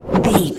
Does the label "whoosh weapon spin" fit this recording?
Yes